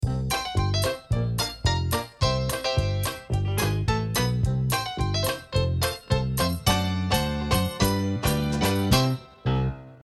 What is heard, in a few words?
comic